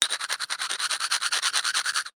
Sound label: glass broken piece scratch on hard surface; zapsplat